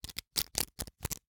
Hwatu, cardgame, hardplastic, small, clatter, stack, drop, loose; Eiravaein Works; Vaeyan IV